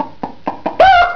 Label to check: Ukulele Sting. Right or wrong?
Wrong